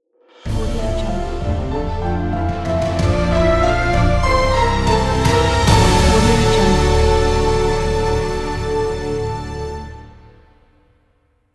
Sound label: orchestra and piano logo